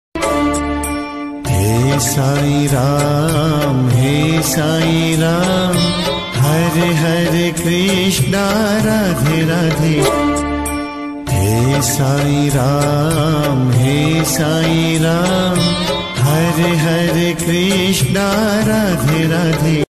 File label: Ringtone